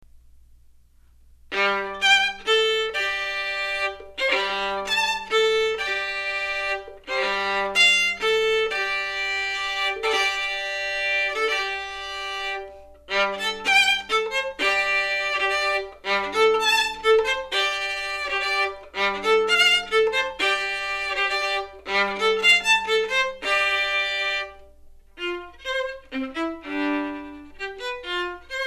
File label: Highland Fanfare